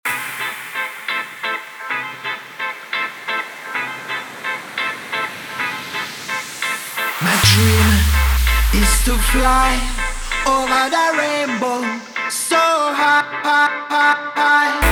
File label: intro outro 130 bpm; Prodeejay Remix